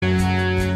radio banjo flinger countryhouse

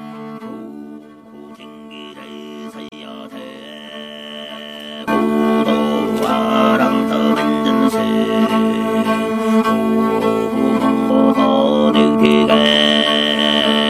Mongolian Throat Singing

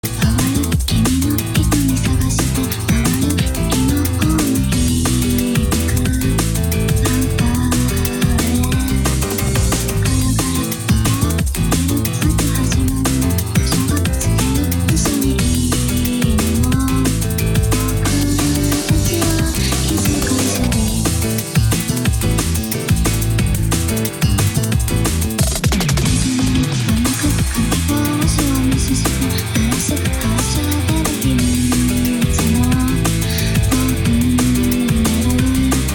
Drum'n'Bass Remix

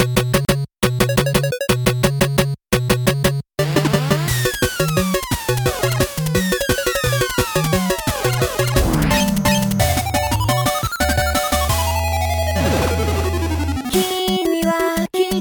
spiral chiptune mix

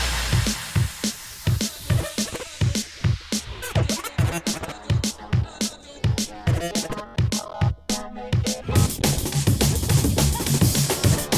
Mini Bassdrum

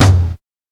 TOM, Jungle; Gorge Sample Pack Vol.1; San Gabriel

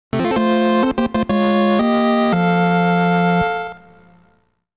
Fanfare

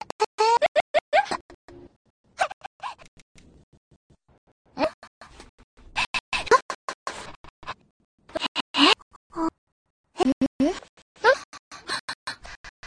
anime girl noises

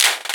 clap; Rialasten 2022 Xmas Sample Pack

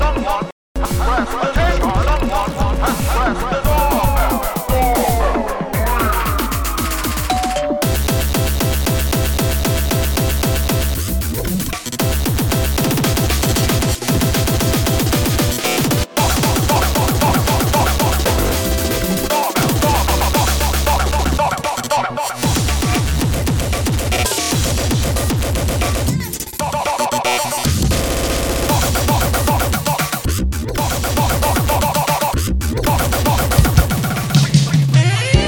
Doorbell

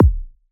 kick; Rialasten 2022 Xmas Sample Pack; 2022 Xmas Packs

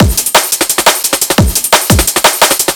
174 drum stalk sequence full; QUARTZ

